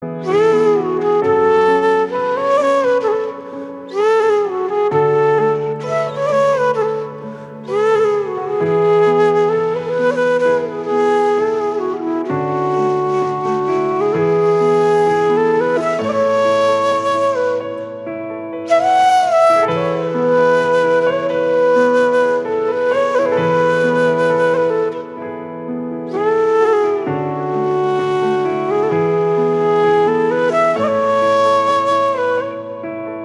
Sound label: Flute Ringtone Instrumental